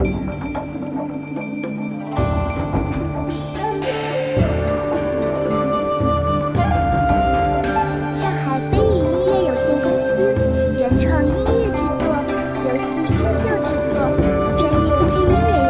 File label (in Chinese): 中国风摇滚轻快待机; 鬼武三国志; loading